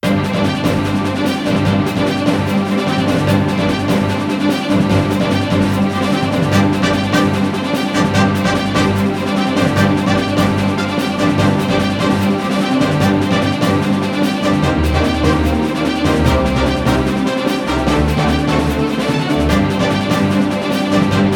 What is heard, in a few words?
Bgm storm